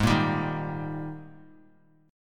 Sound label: Ab Major 7th Suspended 2nd Ab down Bass Standard; strummed